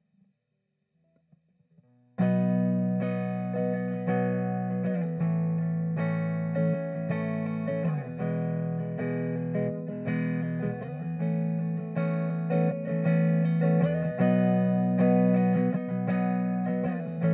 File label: E160 Strummy GTR chords